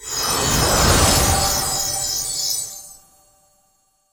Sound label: random wilds portal; finn